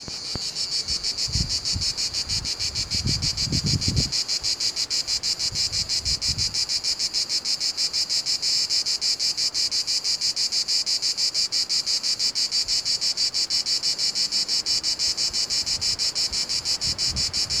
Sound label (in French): Cigale